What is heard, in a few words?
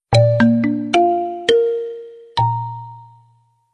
ringtone; Gionee; GN305